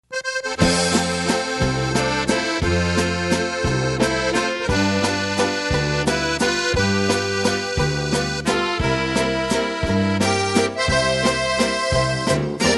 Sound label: Waltz